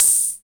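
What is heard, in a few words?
HH CR-78, hi-hat; Sound & Vision - Gigapack I; ANALOG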